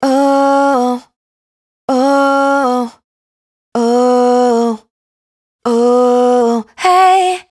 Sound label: riff Oh Woh G#m, Dry Vocals; Raw Vocals; Slate Digital Omnivox Vocal Sample Pack